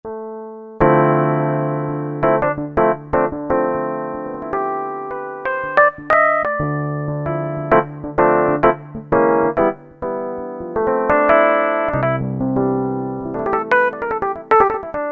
EP, Yamaha TG500

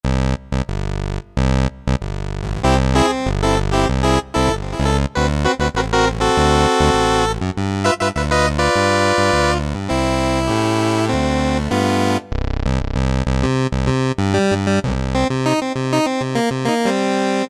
Pulse Wave; Roland U-220; Factory Timbres

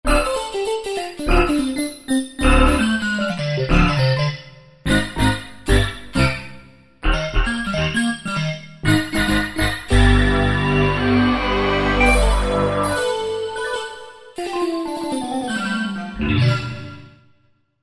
Korg Wavestation Sting Waves

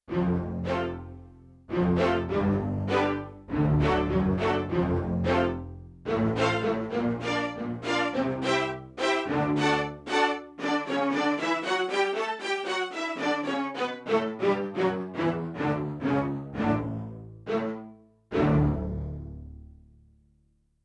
Stereo Raw Rosin, Strings, Yamaha TX16W

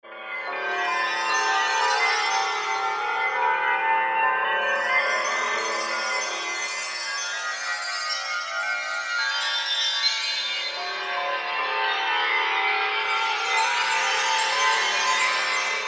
Shining, Korg MSC-10S Ethnic

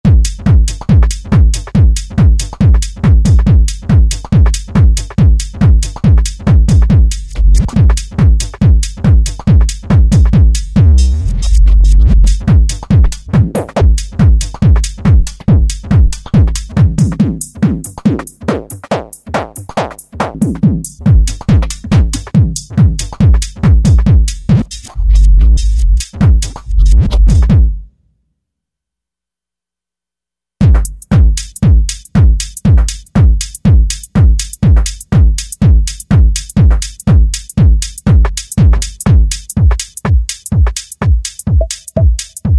Tech House KIT, Roland V-Synth; Factory Patches

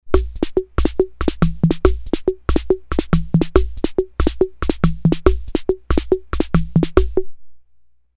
MIXED, Ace Tone Rhythm Ace FR-2L

